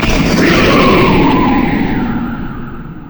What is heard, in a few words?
yasuo base quickdraw wind; LoL